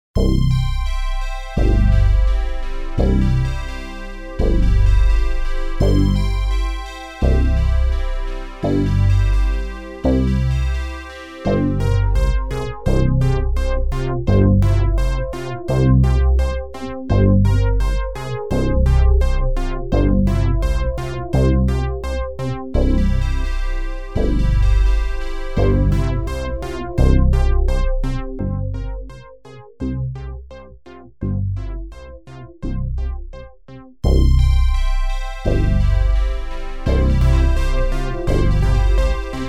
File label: synth 85 bpm; etude no 7